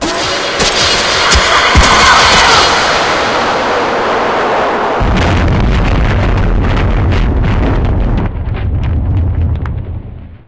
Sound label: stabbing